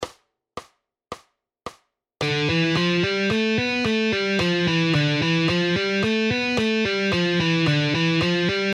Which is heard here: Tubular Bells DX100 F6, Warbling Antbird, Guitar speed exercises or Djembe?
Guitar speed exercises